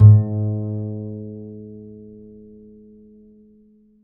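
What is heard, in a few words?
double bass G#1; Univers Sons; Jazzistic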